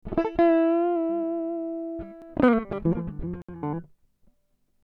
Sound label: Dm 100 GTR Riffs